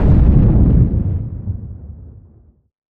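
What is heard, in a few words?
incendiary grenade detonate distant; arccw go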